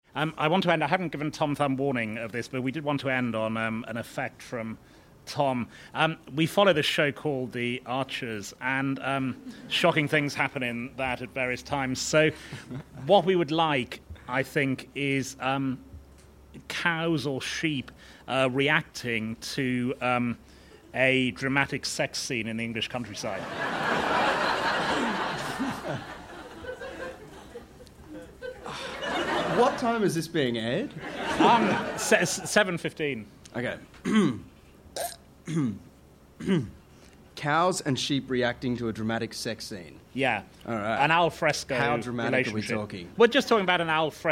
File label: beatboxing